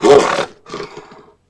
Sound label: angry, antlion guard; Half Life Model Pack; Half Life 2